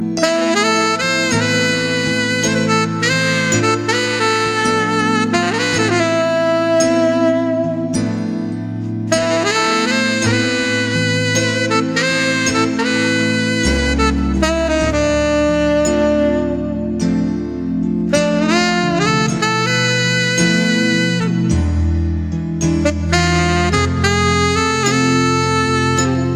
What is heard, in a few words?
Saxofon